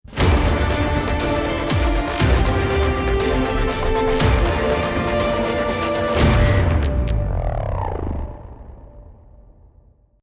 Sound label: won round